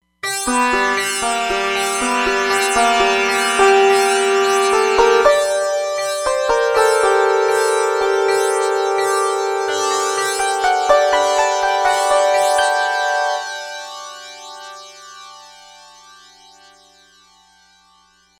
Sitar, Roland Fantom XR; Sitar Baby

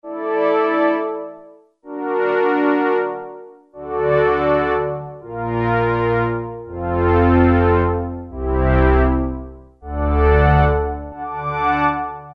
Brass III, Roland Juno-106; Factory Preset

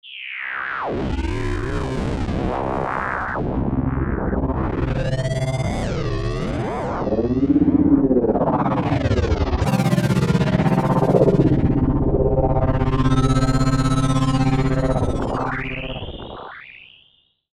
Digital Drone

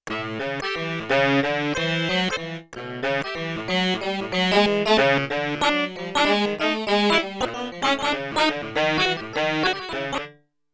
BS&TS Unison Band, Big Band; Yamaha TX16W; Big Band Performance

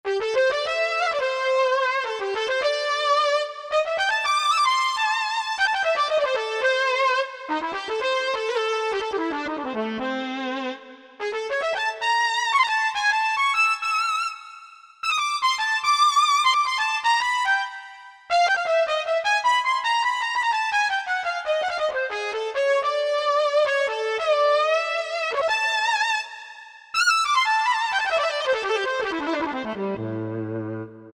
Solo, Korg MSC-6S Fretted Insts

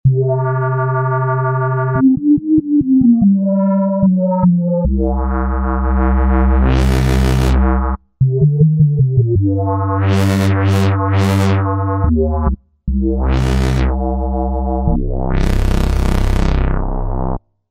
Roland V-Synth; Factory Patches; 068 Jungle Feeva

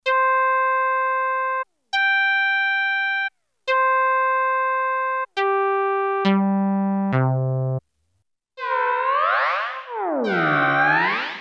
OCT UP & DOWN; Boss SX-700; Factory Preset

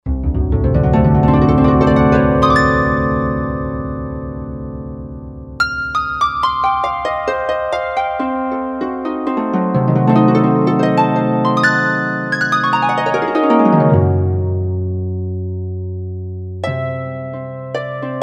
Solo Harp, Korg Wavestation A-D

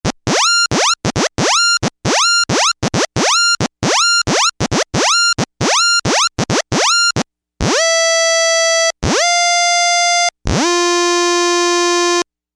Loud Siren, synth

